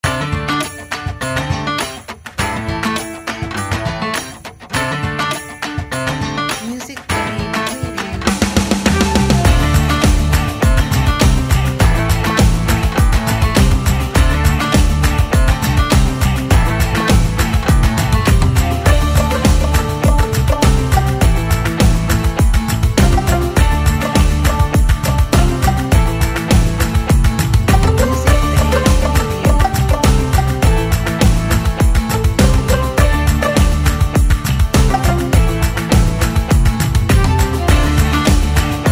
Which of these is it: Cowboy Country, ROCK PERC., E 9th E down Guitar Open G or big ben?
Cowboy Country